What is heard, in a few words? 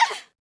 Short Scream; Lewd SFX